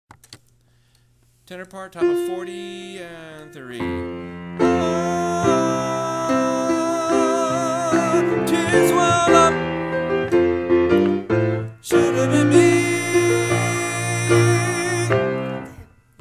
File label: Not Guilty Tenor